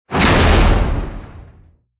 metal; combat; rocket sounds

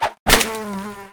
fly die; Trash collection

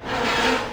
unwind, planetoid

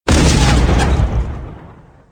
drone gets, salvaging; ships

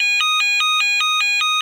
heat shields reduced, ships

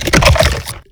flesh; CosmicRage; combat; bigbite

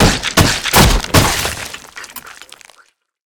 head bash

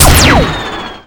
gun; CosmicRage; general combat enemy

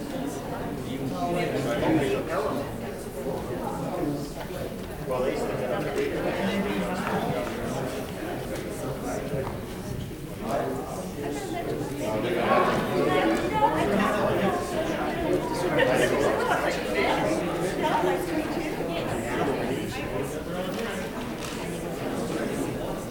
relaxation, ships rooms

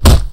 kick

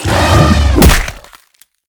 trunk; combat; enemy; CosmicRage; baurg

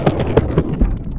skate stop; Cosmic Rage

